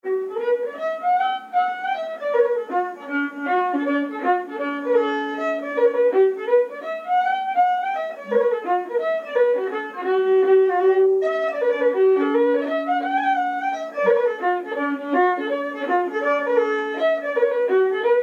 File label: hornpipe; Frisco hornpipe